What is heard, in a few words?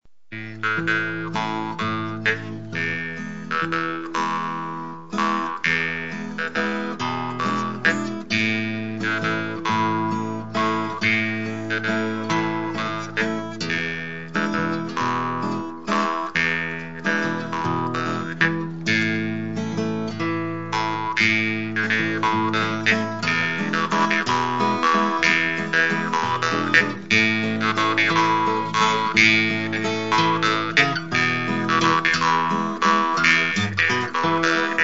Strum und Twang, jewsharp